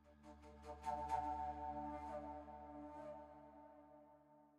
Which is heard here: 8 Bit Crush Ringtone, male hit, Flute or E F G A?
Flute